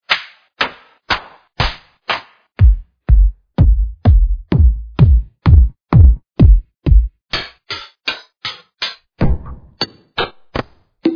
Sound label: studio drums; Brand-new Sample Pack series by FunctionLoops and Planet-H.; G-Stomper Studio-Drums